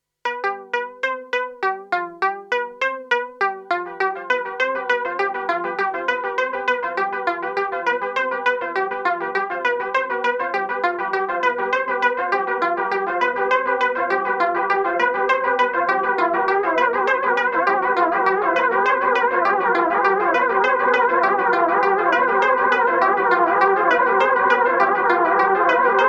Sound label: Strymon Time Line Pattern Repeat Mod Synth